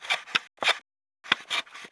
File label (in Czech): Pilovani; Mafie 1